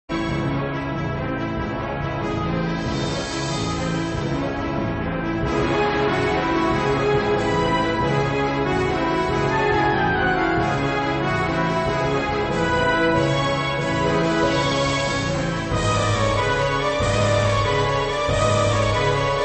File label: Orchestral Overture